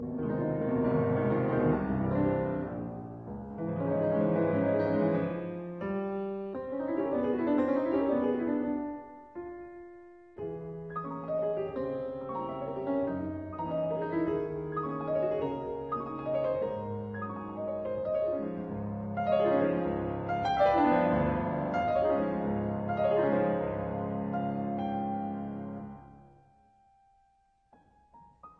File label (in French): Etudes Pour les arpeges composes; No 11